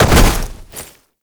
Land Heavy Snow; Movement; Warframe; Uriel

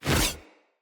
Axe Whoosh Heavy; Weapons; Enemy; Axes; Glades Axe

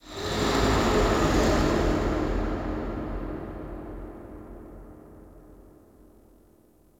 Extraction2 Drill Blast; Warframe; Ambience; Extraction2 Drill Blasts

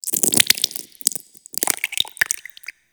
Proto Infested Ancient Taunt Flesh; Warframe; Abilities

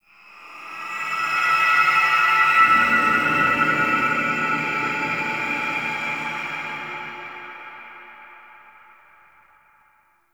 Golden Maw Ambient Texture Two; Warframe; The War Within

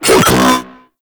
Thermal Shock Cast; Warframe; Runner

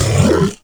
flying mouth attack fire empty; Warframe; ManInTheWall

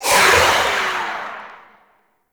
Rifle Tail Indoor; Zariman Grn Wraith

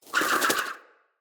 Flock Bird Attack Dive; Characters; Enemy; Abilities; Sinecure; Ranged Hunter Thawtide

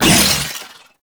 Entangle Cast Vocal; Warframe; Oraxia

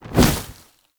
Bird Of Prey Wing Flap Down; Warframe

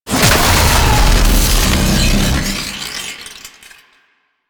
Lightning Stomp Land; Warframe; The New War; Archon; Boreal; Abilities

